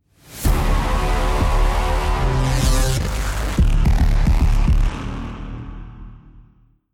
lost round, music

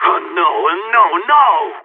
scared emote; Counter Strike; CS:GO; phoenix

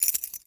jingle, metal keyfob

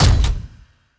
combat step, strider, steps; creatures; Half Life Model Pack

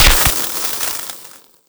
spark sparkles

